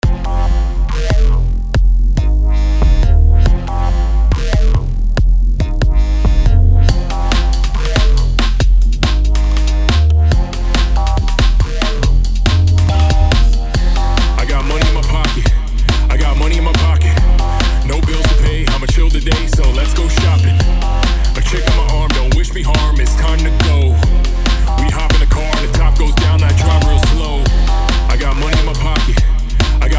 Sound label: Blues Hip Hop